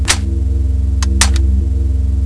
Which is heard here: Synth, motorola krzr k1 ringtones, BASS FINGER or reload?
reload